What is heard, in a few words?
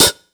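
127 drum 2 L, Fresh Disco House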